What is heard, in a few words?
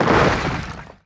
crystal projectile hit, weapons; Stellaris